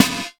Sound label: smooth acoustic snare sample c key